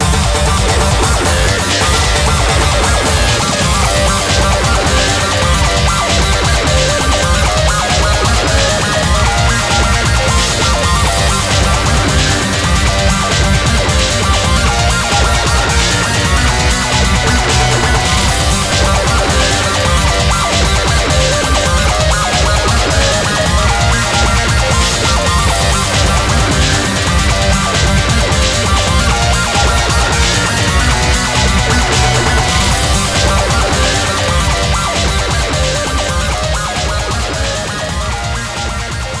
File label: Osc-Sync Carnival; F-Zero GX; F-Zero AX; Lightning